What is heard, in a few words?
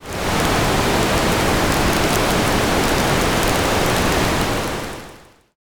fire